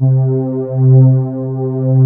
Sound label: SMOOTH PADS, JV 1080; Pro-Rec - Synthsonic